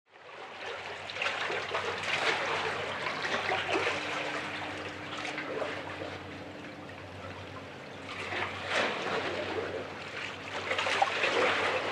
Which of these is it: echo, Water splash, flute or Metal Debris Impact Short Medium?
Water splash